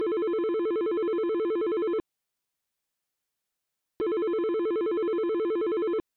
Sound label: Yealink ringtone